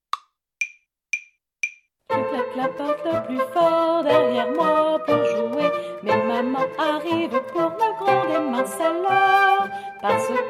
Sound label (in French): roi de la montagne avec chant; hurigny